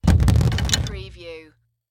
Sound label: Cartoon Drum Drop Stick On Drum